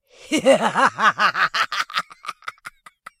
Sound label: laugh; devour